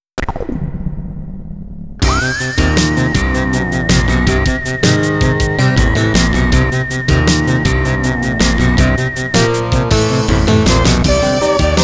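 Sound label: background music, MIDI Sound Source CM-64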